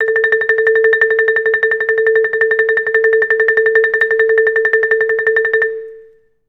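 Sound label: TREMOLO A3, Orchestra; Best Service; Peter Siedlaczek's Advanced Orchestra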